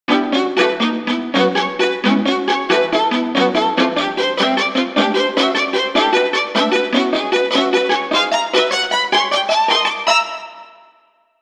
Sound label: Spiccato Pizzicato Fifths, violins; the streetly tapes violins vox